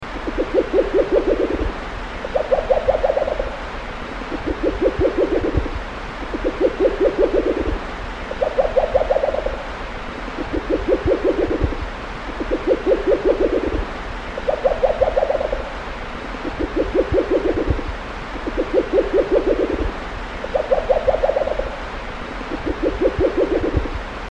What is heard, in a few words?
Band Bellied Owl; Sebastian K Herzog